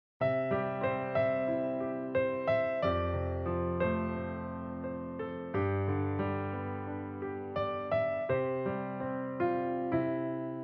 uspokaivayushij i rasslablyayushij ritm fortepiano

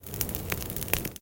korotkij zvuk kaminnogo spokojnogo ognya

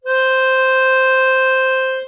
gubnaya garmonika nota lya visokaya

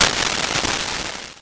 hrustyashij vzriv

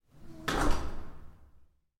zvuk zakrivayushejsya dveri podvala iz tolstogo metalla

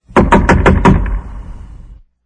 stuk v dver

